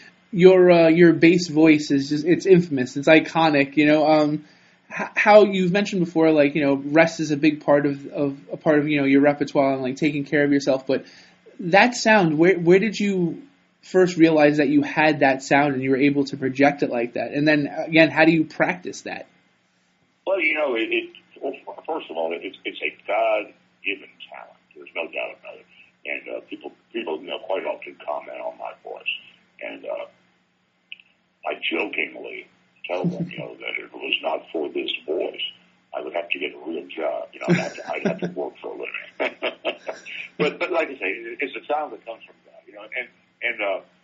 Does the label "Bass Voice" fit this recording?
Yes